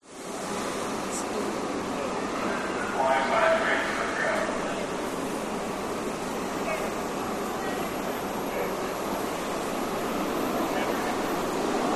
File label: Subway Arriving